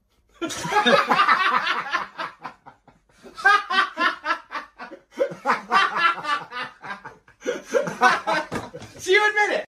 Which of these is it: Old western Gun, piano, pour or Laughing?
Laughing